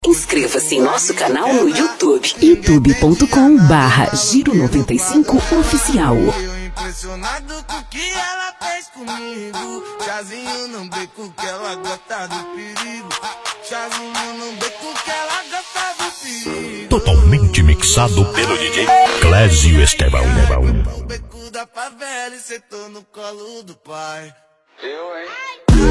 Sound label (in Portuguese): Funk; Mega Funk